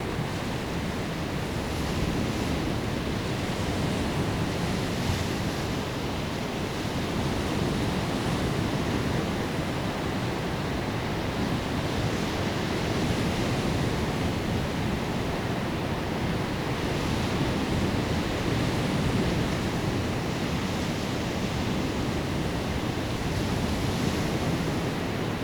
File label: Ocean Sounds; Sea Sounds Satisfying Ocean Sounds